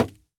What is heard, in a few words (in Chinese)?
竹木破坏声